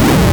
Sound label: SWING; Shadow Knights